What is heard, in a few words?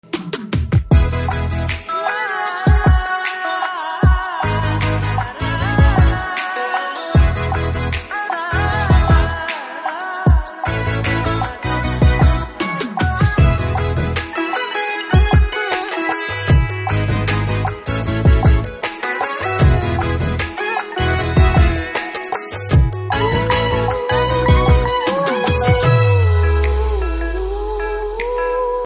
BGM Ringtone